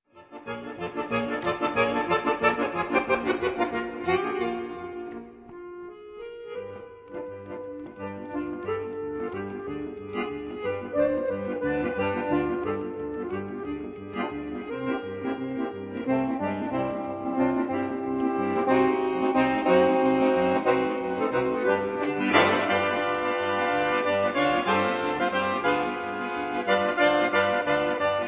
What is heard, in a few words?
accordion sabre dance